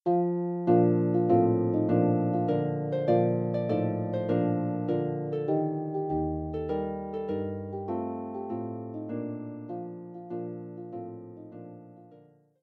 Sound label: battle hymn of, Harp